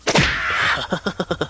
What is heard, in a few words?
menu select; monkeyspank